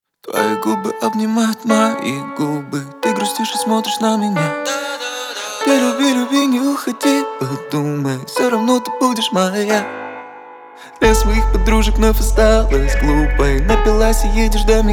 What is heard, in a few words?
siren